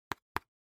Cell Phone Hardware Touch

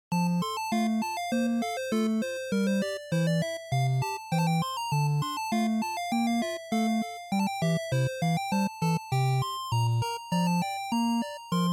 NES RPG Town2

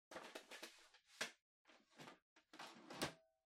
Printer Far Cassette Tray Close

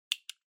Stopwatch Mechanical Stop Hand

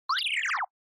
Motion Slide Dry

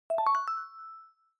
Smartphone UI Sound Dry

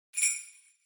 Sleigh Bells Dry Shake Gentle

